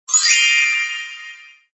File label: bonus; mid flight